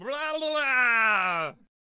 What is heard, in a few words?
Brawler Attack Vocals